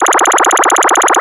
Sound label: Arcade Movement, Video Game Sounds